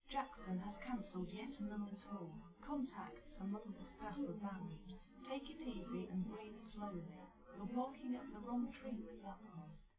female live rec 1m sim; SASSEC07

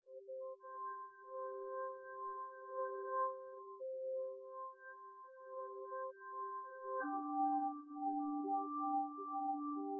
nodrums synthconv 250ms 5cm

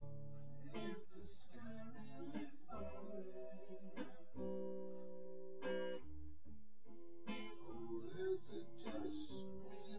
no drums synth conv 250ms 1m; SiSEC08; SiSEC underdetermined

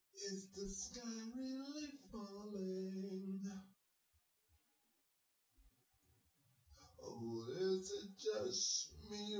nodrums synthconv 250ms 1m

